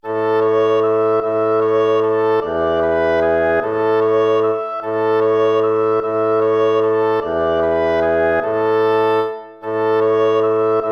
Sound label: waltz; Carulli Ferdinando